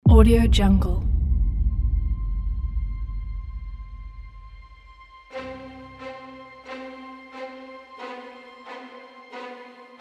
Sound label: dramatic countdown trailer